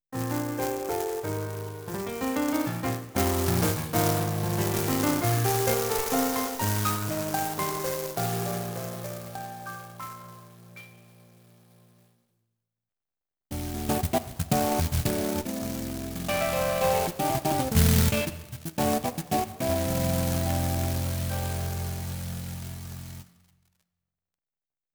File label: xv5080 noise